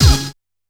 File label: Uh! + Hit; Yamaha PSR-E323; Sound Effect Kit